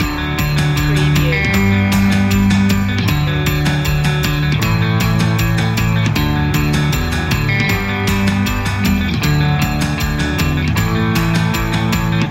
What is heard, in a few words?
free flowing loop